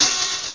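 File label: Open Hi Hat; Drum Kit 5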